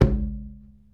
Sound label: Conga Low; Essential Percussion